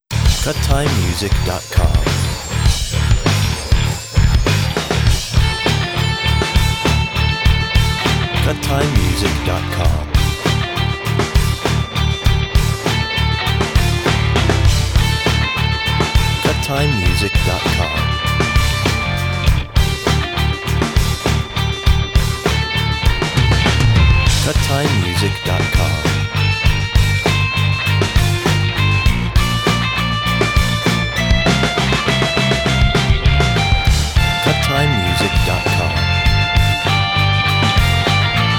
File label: rock